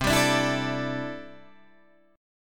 C 6th Add 9th C down Guitar Open D

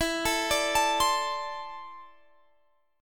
E 7th Suspended 4th Sharp 5th; E7sus4#5; arpeggiated; x,19,19,19,22,20

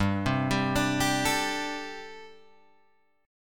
G Suspended 2nd Suspended 4th G, Guitar, Open G; chordshape; down

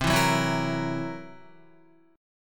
C 7th Flat 5th C down Guitar; C7b5; strummed; JGuitar

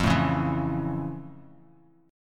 F Augmented Major 7th, Bass Standard; strummed; 13,12,11,9; down

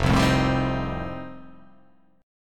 F 9th F, chord

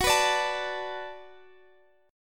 D 6th Gb, chord

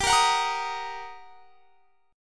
G Minor Major 7th Sharp 5th; GmM7#5; strummed

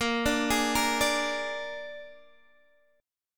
Ab Suspended 2nd Flat 5th Bb, chord

